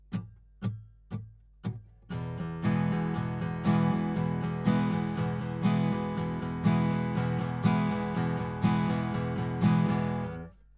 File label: Strum 120bpm